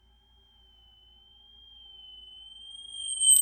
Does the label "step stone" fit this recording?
No